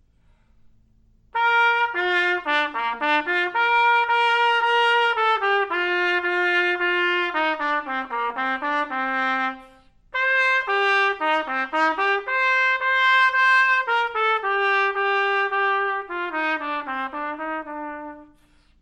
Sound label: Trumpet Tune